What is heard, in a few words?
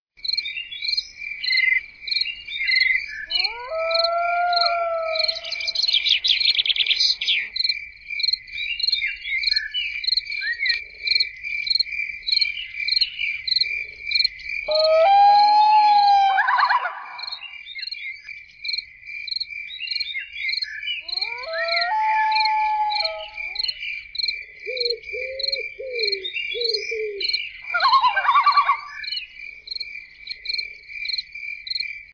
Evening Loons